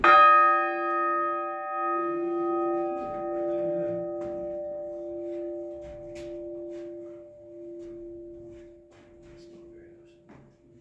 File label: horsham, bells